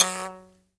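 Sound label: buzz kalimba F#4; Heart of Africa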